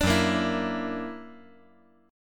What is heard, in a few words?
F sharp Augmented 7th F sharp, chord